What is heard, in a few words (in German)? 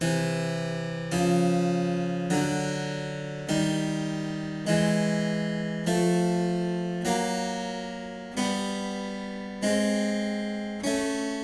Werckmeister Terzen